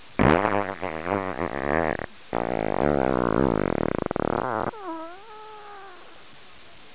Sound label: long fart